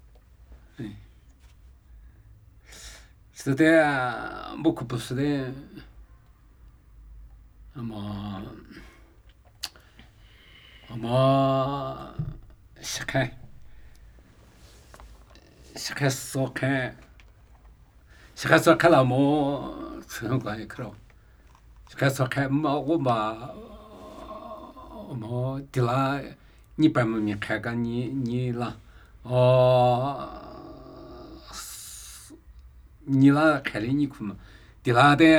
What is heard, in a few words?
Namuyi Tibetan Ritual Chanting; Mbo kho bu su